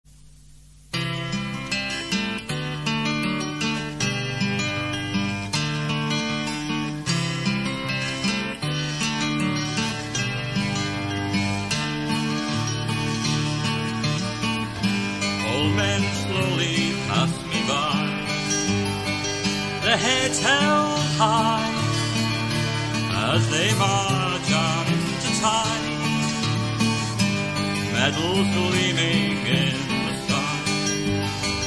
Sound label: Old Men Marching; Offa